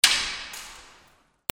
BREAKING

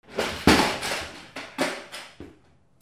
FALLING

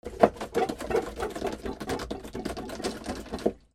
BOATS

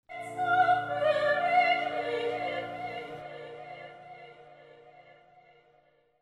MUSICAL

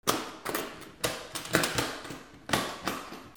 CLICKS

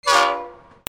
TRAINS

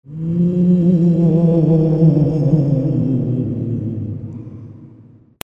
CARTOON

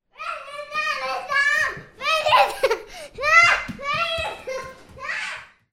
PEOPLE

